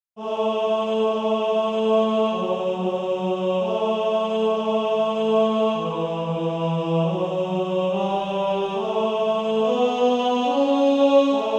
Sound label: Male Choir, Practice Music; Tenor; America The Beautiful